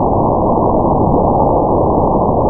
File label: randchirp snr 12a; chirplet chain